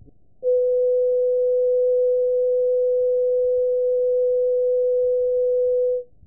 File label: trumpet